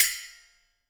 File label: HiHat & Crash, Roland TD-20; HiHats